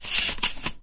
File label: shotgun load, weapons; svencoop